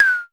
KLONE PERC, Klone Dual-Percussion-Synthesiser; KLONE PERC NW8

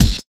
Kick, One Shot Drums

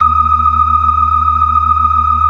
FAST VIB, ORGAN, TREMOLO VIB

